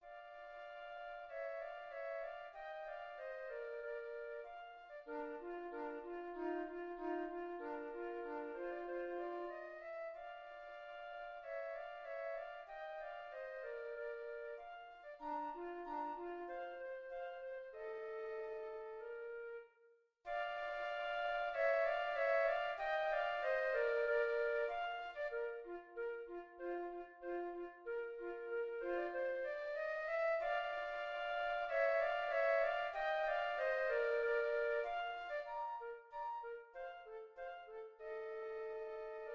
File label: piano